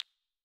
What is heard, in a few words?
menu hit; Tetos Dreams